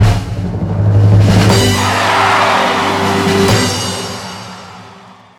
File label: drum roll win and excitment; Rock Papper Scissor